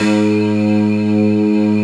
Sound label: D50 Layer, Roland